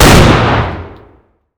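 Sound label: fire distant 50bmg rifle interior; gmod; arccw uc commons attachments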